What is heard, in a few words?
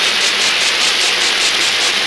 chem missile; Earth and Beyond; EA Games